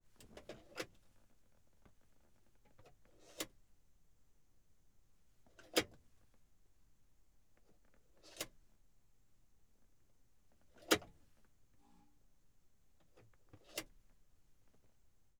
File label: Peterbilt, 359, Ashtray, Interior; 1971